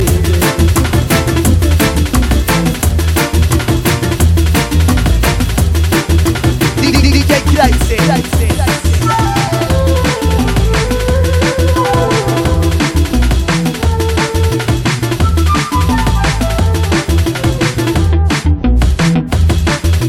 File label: Raggajungle